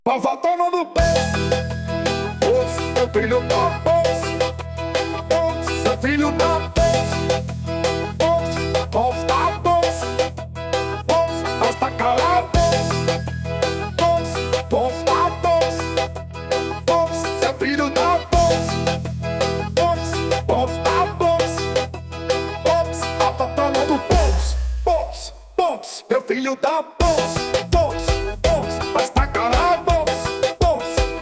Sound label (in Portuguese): Versão Piseiro